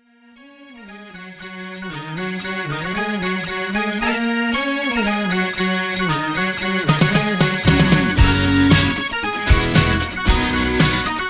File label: Cover Band; Omaha